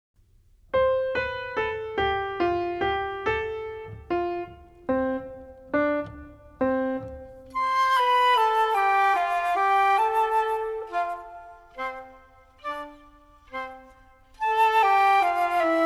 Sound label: music only